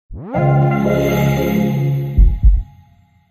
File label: Soundlogo Ambient